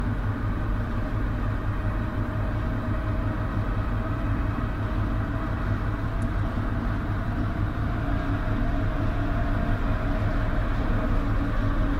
fast, Metro

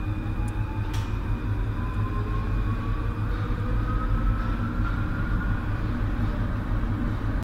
acceleration, Metro

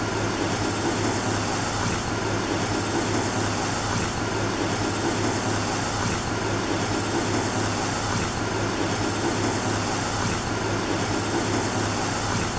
door Move, Metro

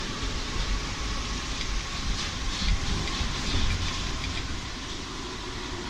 slow, metro

